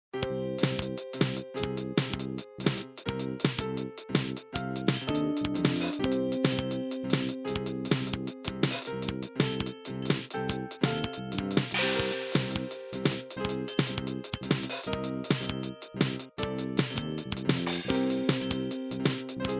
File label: fast pace tune